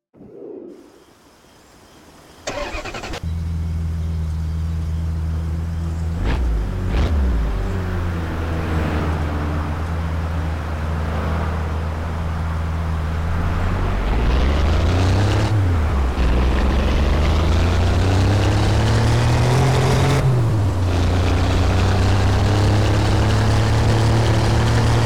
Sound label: alfa romeo giulia quadrifoglio; Alfa Romeo 155 Q4